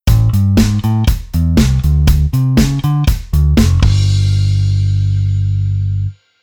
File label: Bass Arr Move Oct CT Appro